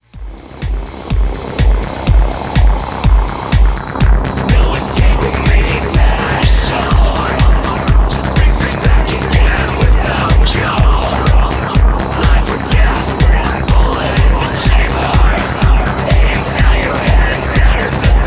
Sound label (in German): selfdestruct mit kick PLUS baseline